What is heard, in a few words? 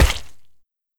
Hit Weak